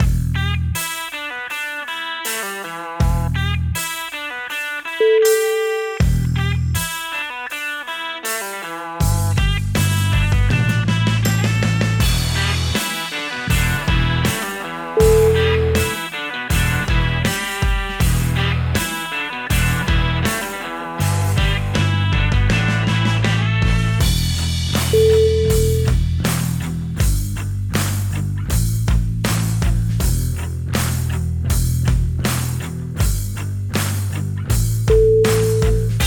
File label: highway blues